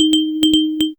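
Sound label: generate a gentle belllike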